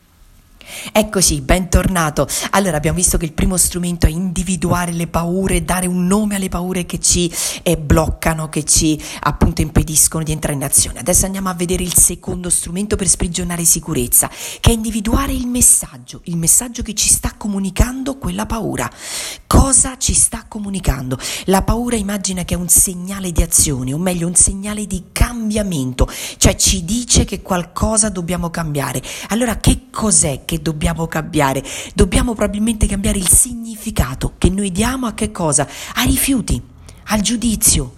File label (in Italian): strumento